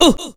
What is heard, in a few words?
Ooh